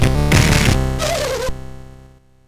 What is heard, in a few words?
sid MOS6581R4; Bubble It